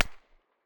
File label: silencer mp9 mono far, weapons